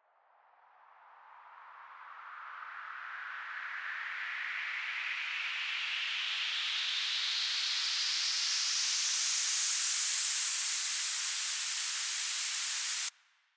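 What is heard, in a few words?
Future Bass FX Riser Noise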